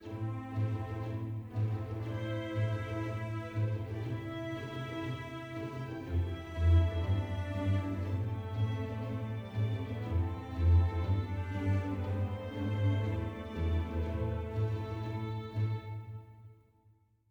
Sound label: heroic soft; Quivr; PS4 Windows gamerip 2016